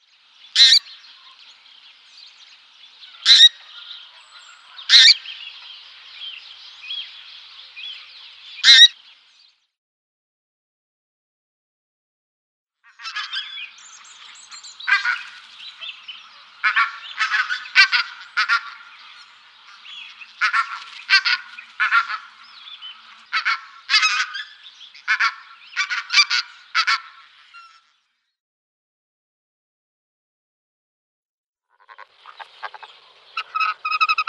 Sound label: Pink-footed Goose